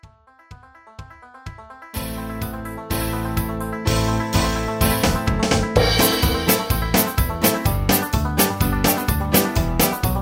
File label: instrumental